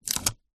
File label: plaster band aid backing peel off